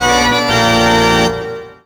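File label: skeleton key; norleans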